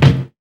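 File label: KICK, DRUM KITS; InVision Interactive; 40 Oz Phat Slammin Hip Hop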